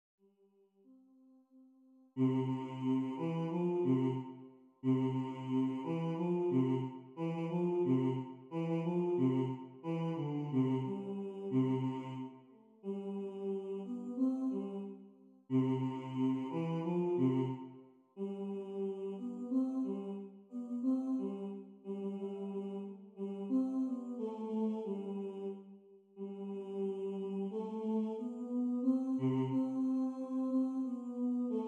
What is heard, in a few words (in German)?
bass